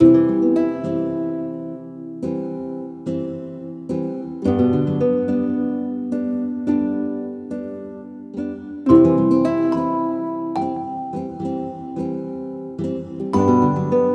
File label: Afternoon Tea - No Percussion Loop; Beautiful Girl Fight School